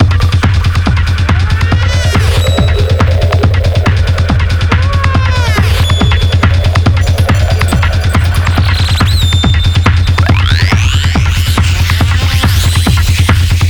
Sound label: psytrance